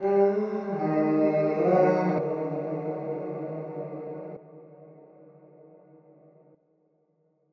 110bpm E, Vocalpack

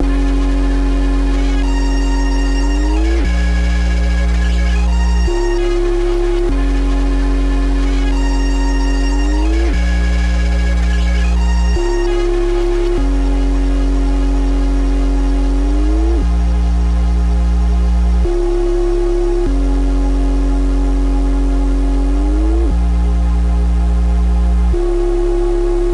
Glass Shards 148 BPM A# Min; Melodies; Cymatics - Immortal Production Suite Beta

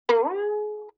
Violin Pluck Pitch Rise

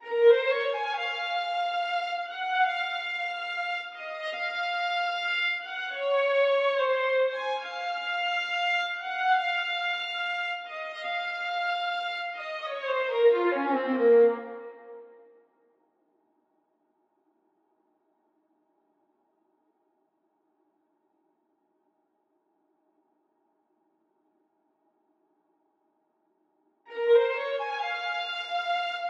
143 BPM A# Min String, Hip Hop; Melody Loops; Loop Stems; Cymatics; Americano

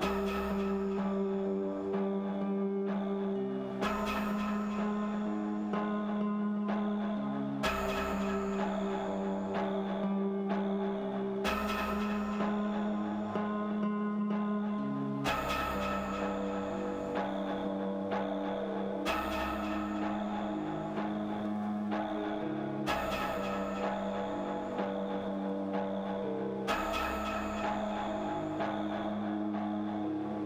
Atmosphere, 126 BPM C Phrygian; Stems; Cymatics; Deep End

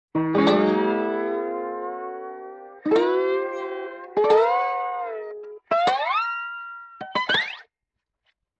Steel Guitar Slide Ups; Vintage Cartoon; Musical Elements

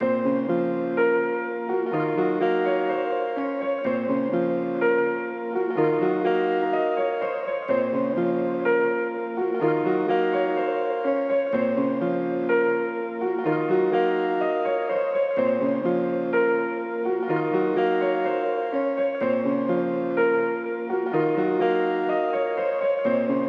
125 BPM A# Min Piano; Melody; Trap; Loop Stems; Cymatics; 2022 Melody Collection - Trap Pt 2; Lament

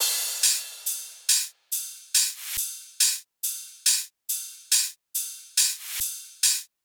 Riddim Drum Loop 140 Top; Ghosthack; Riddim Essentials Volume 2; Full Drum Loops

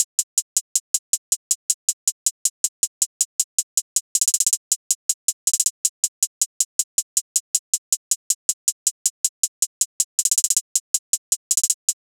Hihat Loop 159 BPM; Runner

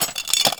Chest Unlock Small; Game Essentials

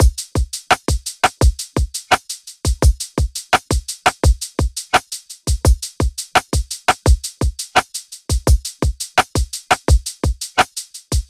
Full Drum Loop 170 BPM; Drums; Humble Hip Hop Sample Pack; Thirst

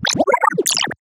FX Aquabot; Riddim; One-Shots; SFX; Ghosthack; Riddim Essentials Volume 2

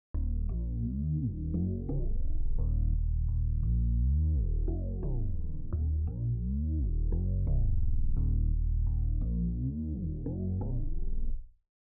Simple Melody Loop - 86 BPM D# Min Bass; Cymatics; Oracle Sample Pack

